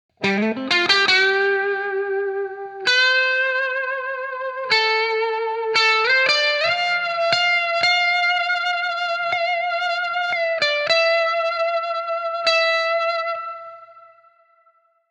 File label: fast and clean